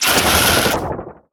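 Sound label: Teleporter Stealth Attack; Evolve; Wraith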